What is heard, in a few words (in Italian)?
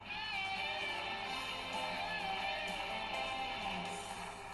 catena musicale